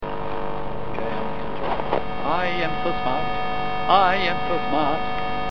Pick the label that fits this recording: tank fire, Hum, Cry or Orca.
Hum